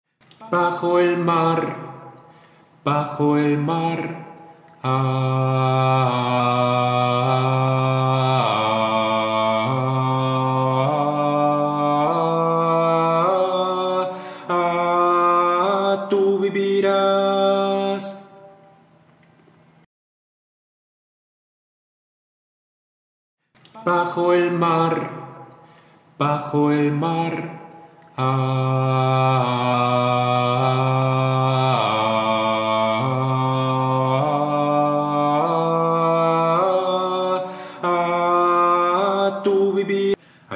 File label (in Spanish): alto; LASIRENITA